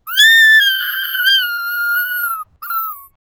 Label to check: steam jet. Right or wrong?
Wrong